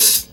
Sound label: loud open hi hat d sharp key, Royality free open hat one shot tuned to the D# note